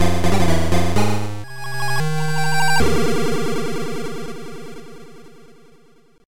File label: Game Over; Lee Bee; Basterdale Farm